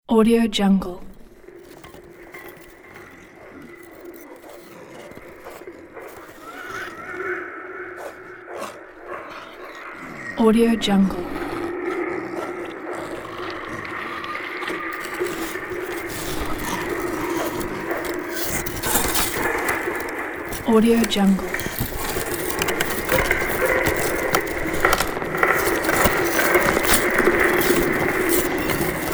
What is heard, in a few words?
sinister ambience